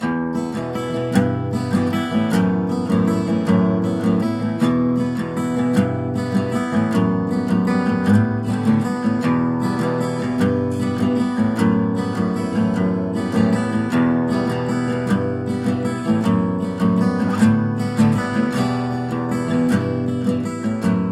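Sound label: Music Guitar; Last Day on Earth Survival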